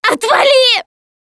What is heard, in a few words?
scream while pounced